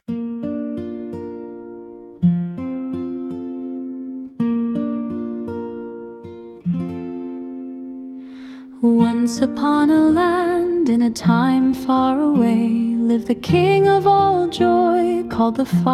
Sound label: lullaby